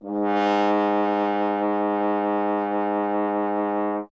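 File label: BRS French Horn, Solo Instruments; Miroslav Vitous; Solo Instruments VOL-2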